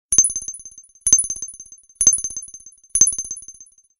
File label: Clink Clink